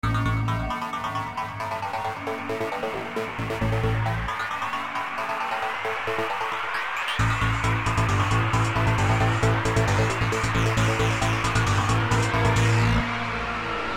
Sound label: Music